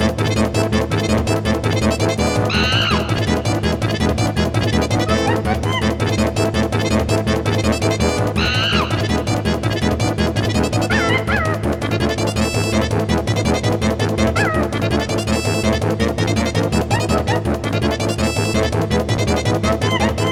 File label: barrel